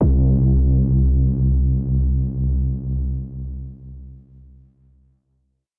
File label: Bass 808 C2; Army